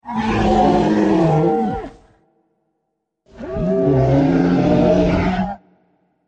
calling triceratops

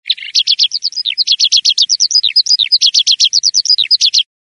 woodlark chirp

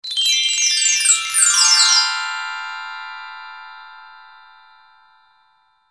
Pixie Dust Twinkle

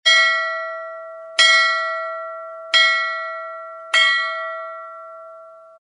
bell brass boat ring

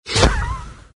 fantasy poof you're gone

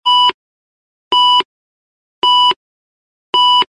beep computer repeating

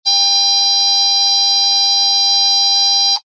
alarms buzzer constant high

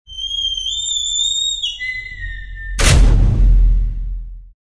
door dungeon castle close

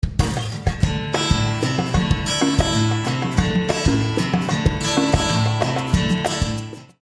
smooth and sultry

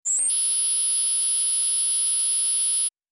Retina Scan Alert

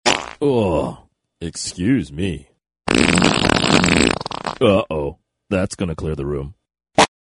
comedy got gas

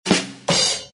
drum rim shot

